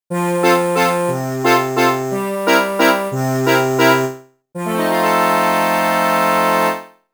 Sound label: Pier (Jingle); thats so raven psychic on the scene